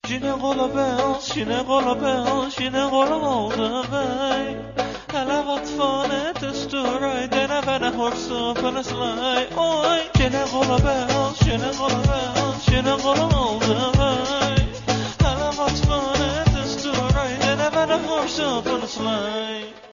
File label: turkish jingle bells ringtone